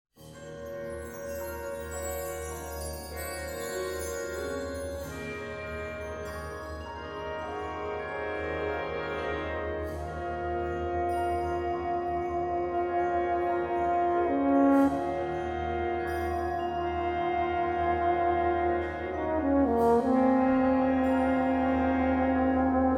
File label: Concerto for Euphonium and Wind Ensemble, Calmly, with much expression